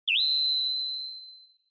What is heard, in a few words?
Flash High Long; Onoma; オノマトペ 光る; 高 長